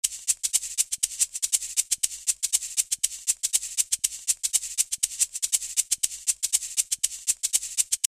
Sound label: shaker